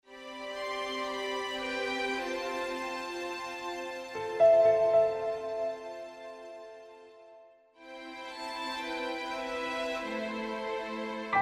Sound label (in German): Märchenhafte Entspannungsmusik